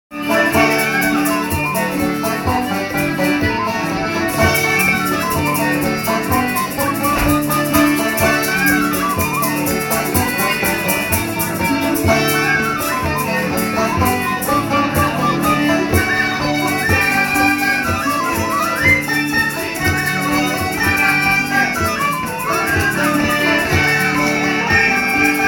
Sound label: Irish Session Music At A Bar